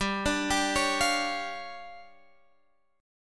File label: D Minor Major 7th Double Flat 5th G, guitar